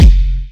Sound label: kick heroine